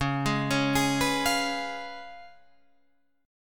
C sharp 7th C sharp, Guitar Drop D

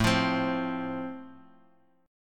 A Minor Sharp 5th A, Guitar, Open G

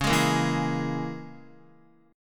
Db Minor 6th Db down Guitar; Dbm6 strummed; JGuitar

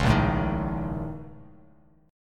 A sharp Minor Major 11th, down, Bass Standard; strummed

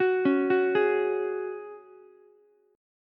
Gb Suspended 2nd, Ukulele; arpeggiated; 11,13,14,11

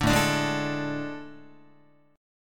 A Major 7th Suspended 4th, Guitar

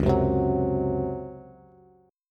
Db Major 7th Suspended 4th Db down Bass; strummed; JGuitar

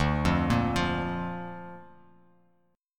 D Minor Sharp 5th D down Bass Standard; Dm#5 arpeggiated; JGuitar

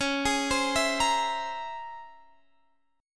C sharp Minor Major 13th, Guitar Open G

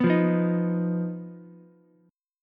Eb Suspended 2nd Sharp 5th, Ukulele; strummed; chord; JGuitar

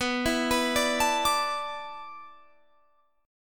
A Suspended 2nd Suspended 4th

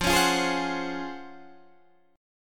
Db Major 11th F

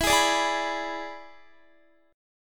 E 7th E down Guitar; strummed

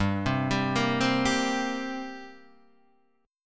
G Minor Double Flat 5th, G, Guitar, DADGAD

